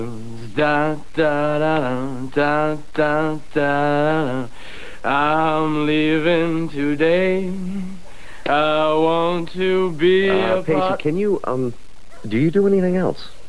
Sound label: pacey singing